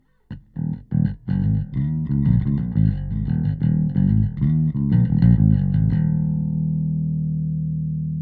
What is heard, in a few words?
SplitEQ bass Warm Dark Bass Guitar